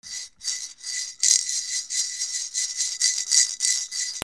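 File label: percussion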